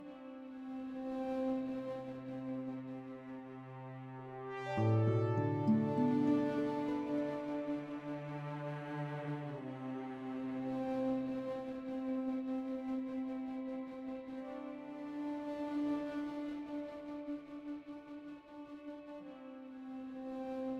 Quest Melody Loop; bakugan champions of vestroia 2020; switch gamerip

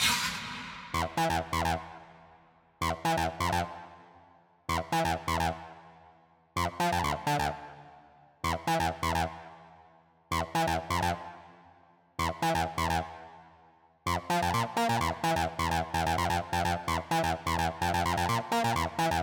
Doom Mix Stem Loop Layer 3; Centipede Recharged

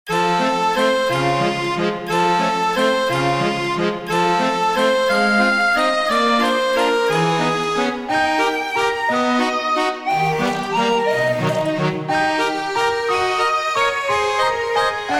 Waltz; Fire Emblem Heroes; Happy Event 1

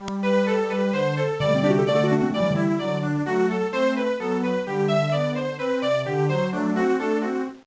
Bathroom Jam

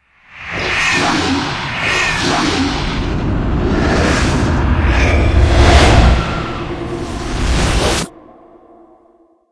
End Stinger; Doom 3

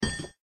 Drop Crowbar; Granny 3; Android

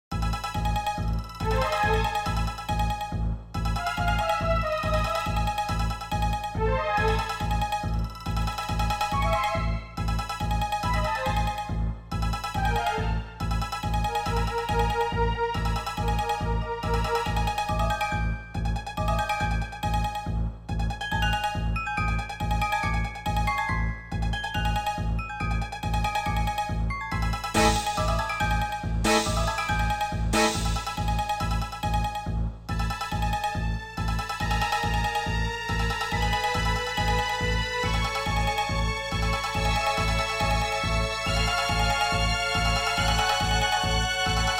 Negative; The Witch and the Warrior; RPG Maker

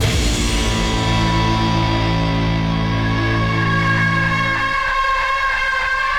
Outro 120bpm; battlefield hardline; ps3 ps4 windows xbox 360 xbox one

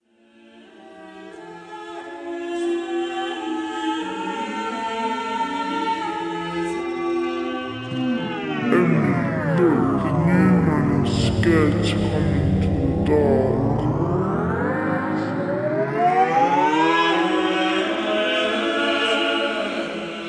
choral; Dark Fall II Lights Out

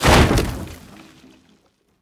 Hiding Spot Destruction Empty; The Inn

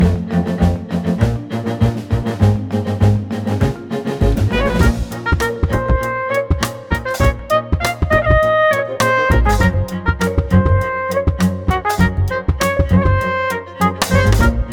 foothills bgm loop 100bpm

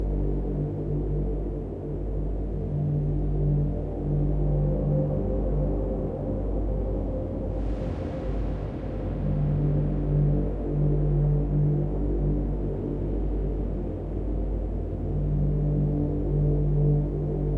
Open Your Crate; Android iOS mobile Switch Windows; modern combat 5 blackout

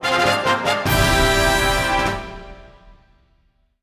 Boss Clear Fanfare

